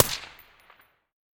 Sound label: usp45 silencer mono distant